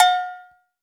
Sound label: COWBELL